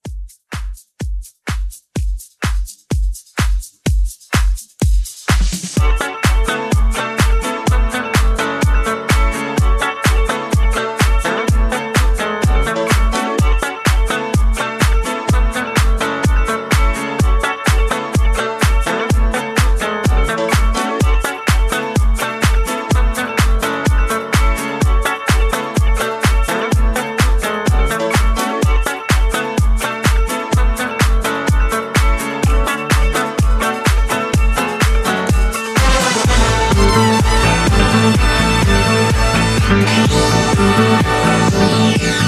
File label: Mixshow Edit